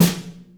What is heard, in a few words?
subtle reverb snare sound f key; Duration 0:01; Mono; snare drum samples